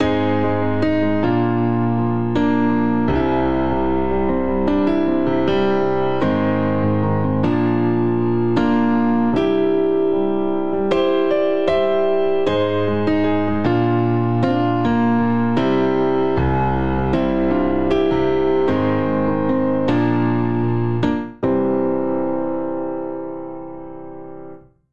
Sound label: Progression Hammer On Broad Dramatic biv - bvii - I C Major 77 bpm, Grand Piano; MIDI Clips; Ableton; Factory Packs